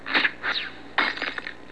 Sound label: slingshot breakin bottle; Slingshot breaking glass bottle